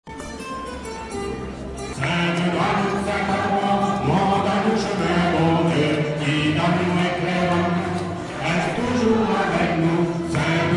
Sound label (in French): Chant